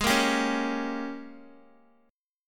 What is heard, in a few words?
G sharp Major 7th Suspended 2nd Suspended 4th Guitar